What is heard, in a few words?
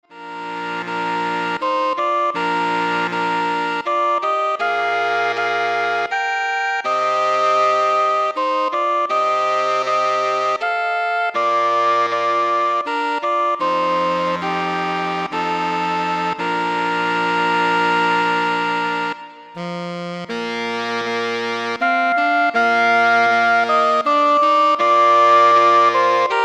Saxophone Ensemble